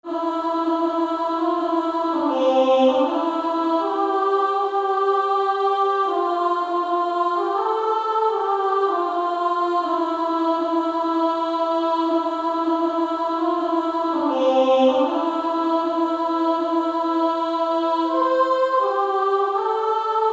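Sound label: Female Choir, alto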